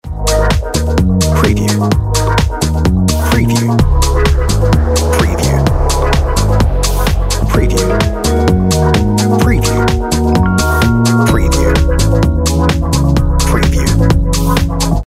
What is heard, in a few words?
On Hold Music